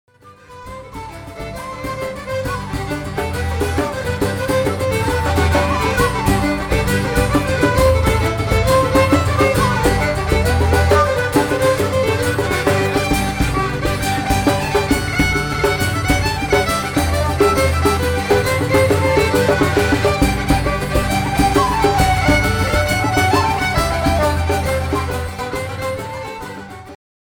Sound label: Trad Tune